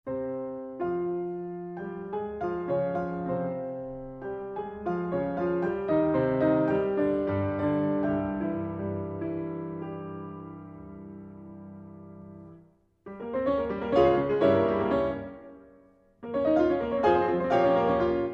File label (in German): Klav.; Brahms